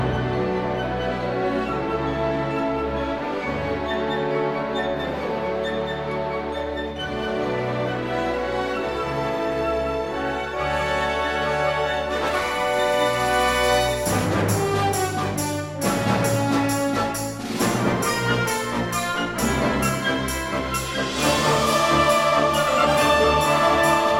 symphonic movement; Legend Of Zelda Twilight Princess

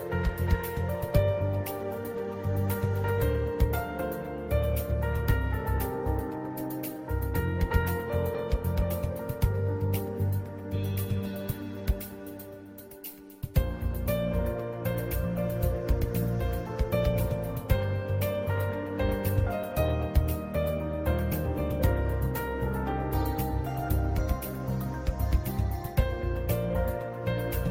piano

